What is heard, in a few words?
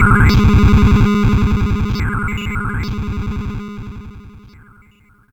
lightning; scummvm; The Griffon Legend